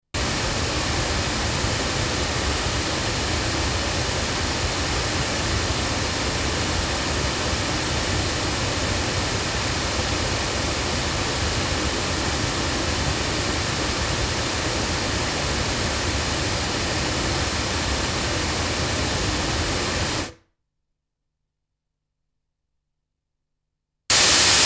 Sontronics Helios 8 loudspeakers, microphones recordings